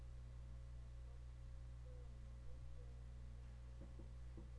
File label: rain